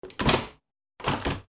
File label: door